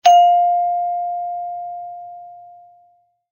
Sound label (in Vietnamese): Tiếng chuông cửa 1 âm